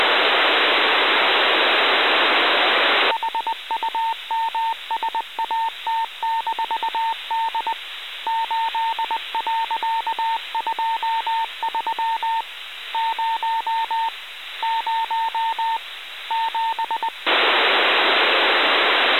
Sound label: HumSat D 437162kHz